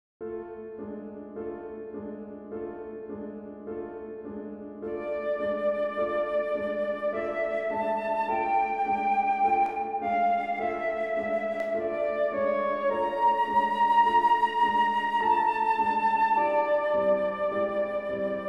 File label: Flute piano